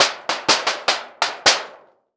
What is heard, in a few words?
Impact Hammer And Machete Hit Sheet Metal Multiple